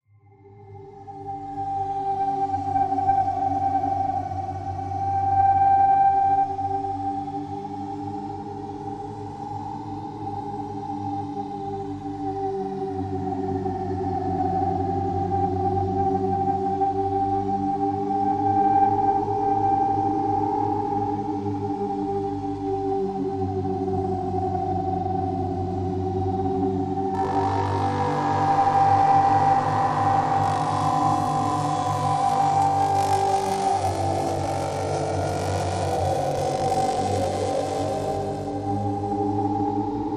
Over and Over Atonal Movement Distant Ambient Wind